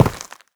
step run, footsteps, player, dirt

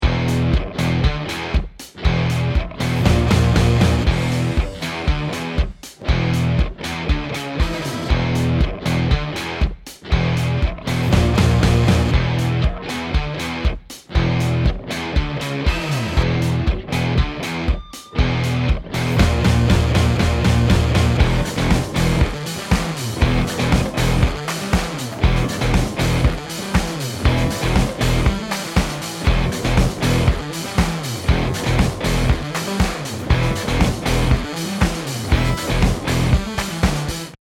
bomb planted

